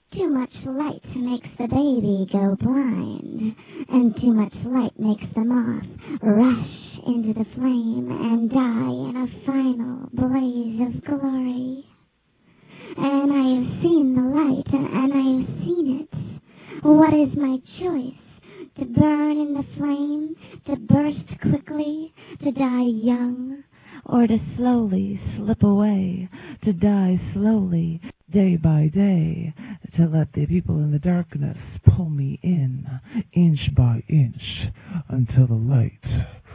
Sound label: vocals